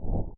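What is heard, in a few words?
anomaly deceitful disappear; Necromancy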